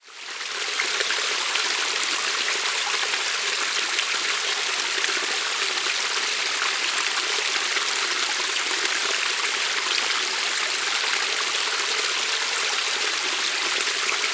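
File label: nature waterfall small narrow shallow buderim forest queensland australia; buderim forest qld australia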